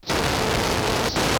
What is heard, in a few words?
Digital Noise, layering samples